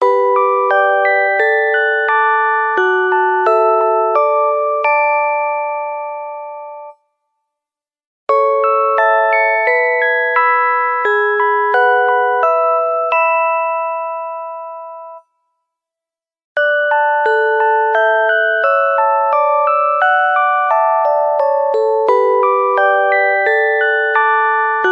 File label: ringtone